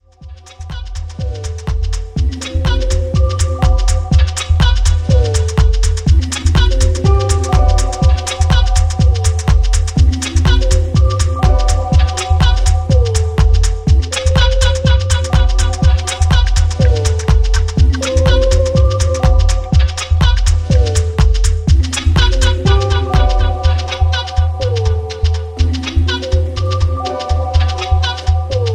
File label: Filter Funk